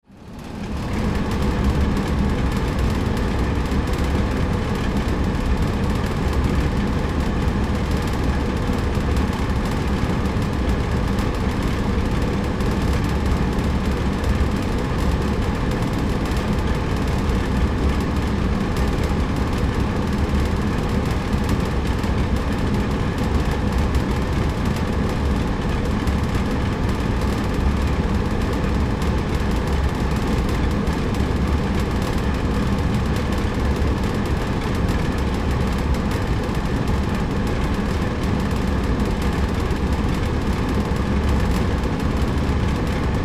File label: Air Conditioner Start Up Shut Down Factory; Power Tool; Power Tools; Industry; Blastwave